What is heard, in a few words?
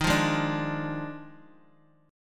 Eb Major 7th Suspended 2nd Suspended 4th Eb Guitar 4F 4A 5C 5G 5C 6E; EbM7sus2sus4; strummed